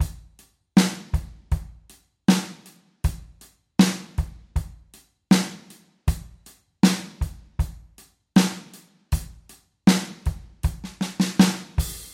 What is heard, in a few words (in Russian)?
Ballad Groove, барабаны